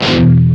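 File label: Distorted Guitar Power E Palm mute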